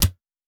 2 layers 2 percussions; M8; percussion and foley

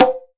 CON TOPS, Cuba CONGA; AKAI S6000 CD-ROM - Volume 5

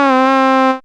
syn, Dance Synths, Wasps; ProSamples vol.37

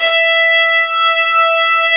Virtuoso Strings; ILIO; Kirk Hunter Virtuoso Strings VOL-1

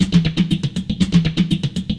AO 120, Dance Grooves; Best Service; Dance Mega Add On Grooves

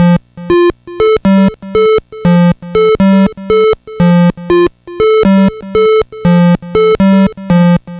Atari 2600 Synth FX

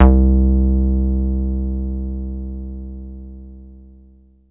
303-Style single hits

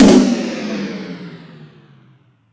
More Toms, E-MU Pro-Cussion

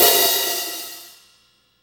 HH OP, Drum Dry; East Collexion; Drum 1

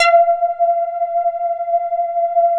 LEADS; Masterbits; Vintage Voltage

